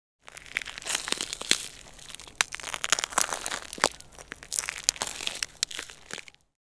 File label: Standing on frozen lake soft ice creaks